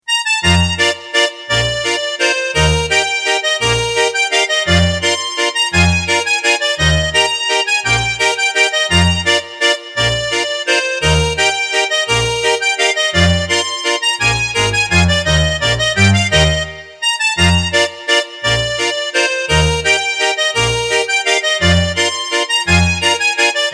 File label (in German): Altbayerisch